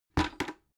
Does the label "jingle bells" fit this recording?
No